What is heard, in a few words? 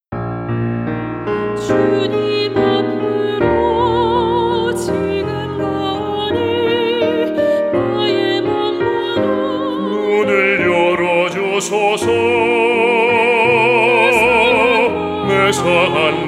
BASS